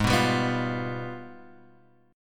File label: Ab Minor Major 13th, down, Guitar, Open G